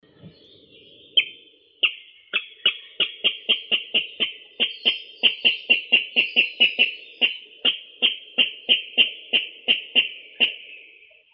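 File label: water rail, Rallus aquaticus; pastushok vodyanoi; zvuki prirodi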